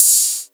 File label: Open Hihats, Cymbals; Vengeance Samples